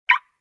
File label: close